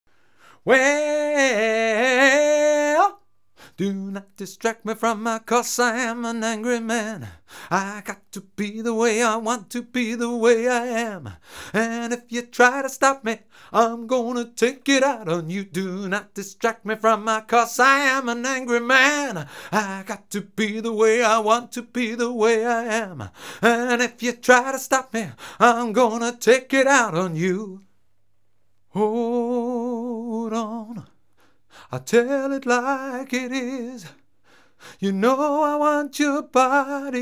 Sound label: Vocal - Male Rock (mono); dbx test